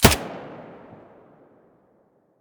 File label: mp5 muzzle mono, weapons